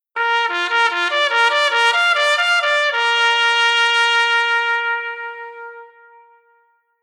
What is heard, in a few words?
Horn Unis Tp Solo